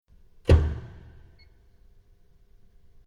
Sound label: Industrial Mechanical Big Electrical Lever Switch On; Switch Sound Effects; Switches